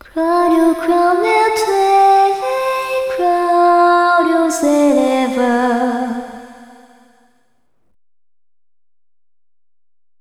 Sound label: Fonic Hymn; Tales of the Abyss; PS2